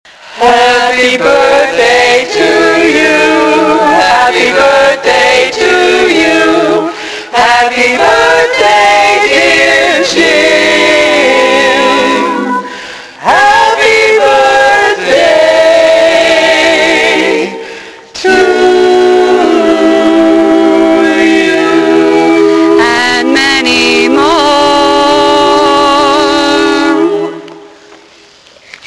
Chorus Delivers a Birthday Greeting, a-cappella-group